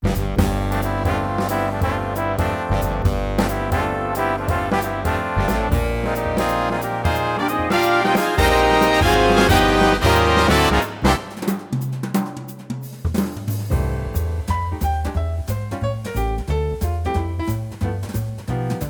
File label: Jazz, Yamaha Genos